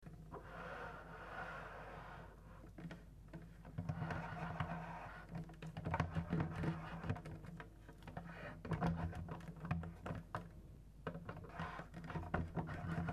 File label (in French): deplacement, harpe